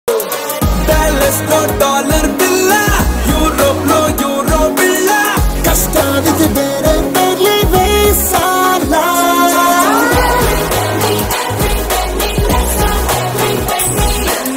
tamil ringtone

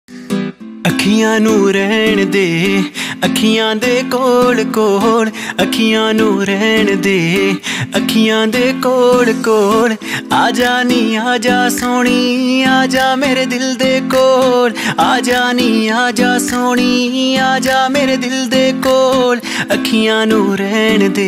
punjabi ringtone

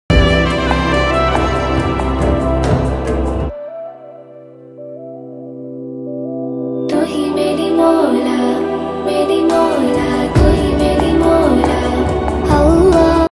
female version arabic ringtone

